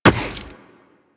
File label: Run Rock, Footsteps; DE; Warframe; Tenno